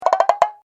Mechanism

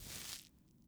Grabbing

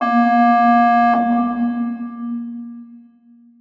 attack fx alarm; SpaceshipDemo

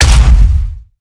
grenade; ML-Agents; FPS Game

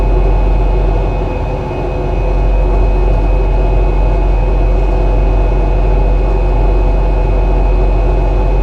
passage amb ventilation loop; Ambient; SpaceshipDemo

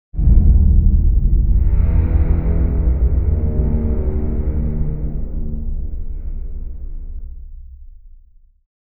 audio logo; SpaceshipDemo; vfx unity

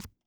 Phoenix Chick Flap

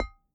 Pots

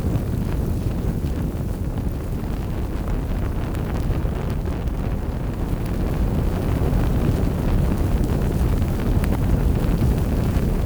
room fx fire loop; Ambient; SpaceshipDemo

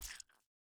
Jelly Sound

